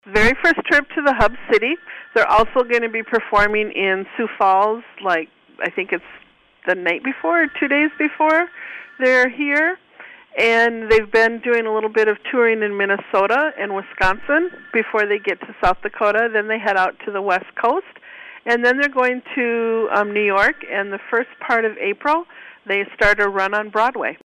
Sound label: Concert